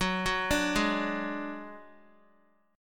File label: F sharp Suspended 2nd Sharp 5th, Guitar; arpeggiated; JGuitar